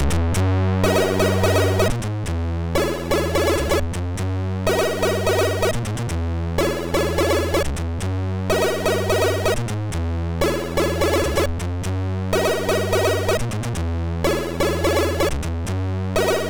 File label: Simple Switchord, MOS6581R2